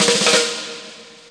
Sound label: snare